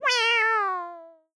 cat meow synth sadly

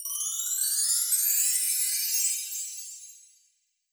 bar chimes up reverb

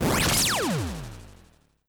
laser cannon; laser cannon 04; renpatsu muki

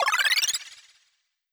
heal middle

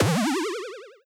bounce spring jump

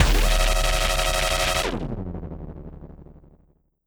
laser cannon non charged shot

